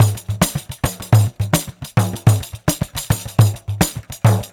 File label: Pandeiro, 4-4 Drum Loops, Perc Loops; Drum Tools